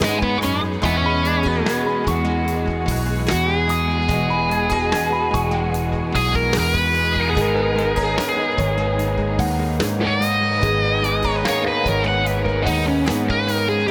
Daking Fet III 2Mix Comp

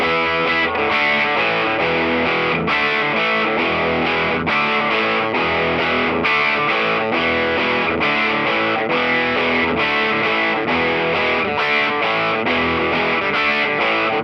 Electric Chorus

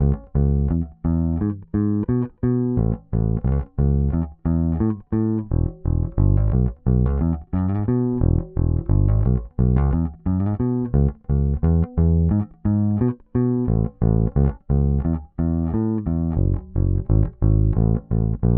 Bass Chorus

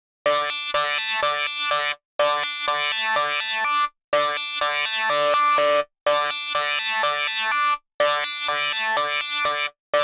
Synth; TubeTech

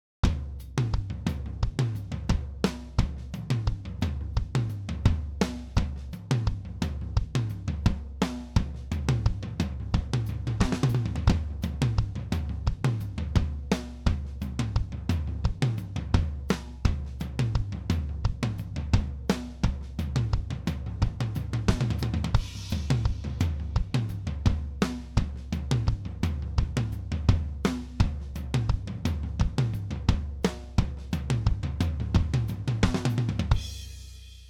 Drum Groove

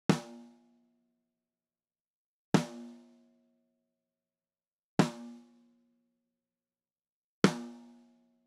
Snare; Daking

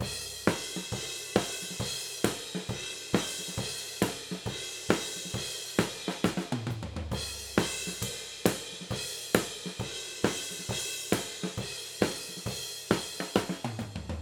Chorus OHs

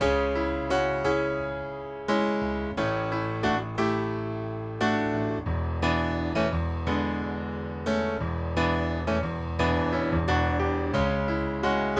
Piano Chorus

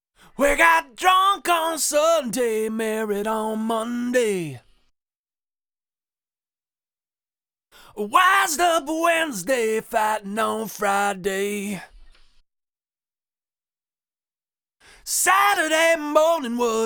Lead Vox

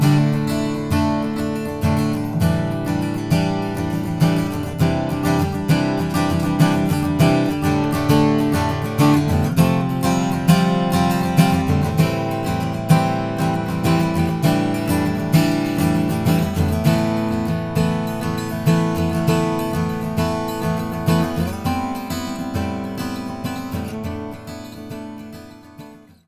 Rhythm Guitar

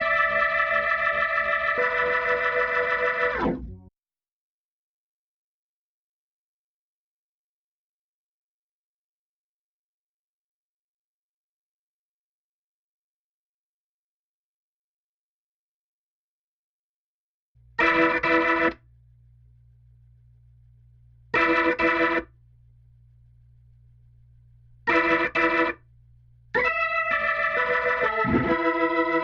Organ

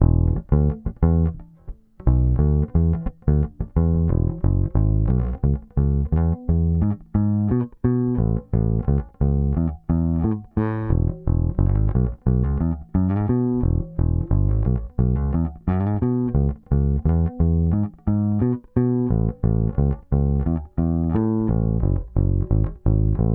Bass

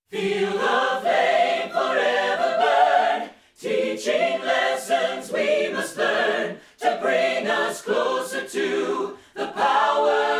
Choir Comp; Daking; Fet III

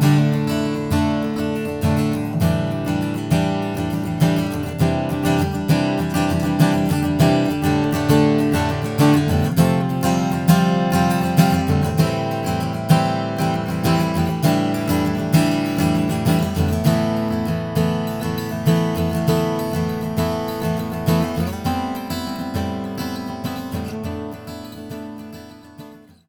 Rhythm Guitar; MA-201fet

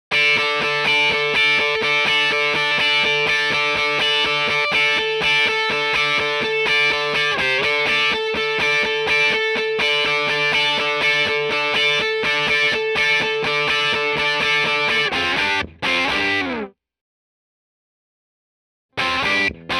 Electric; TubeTech

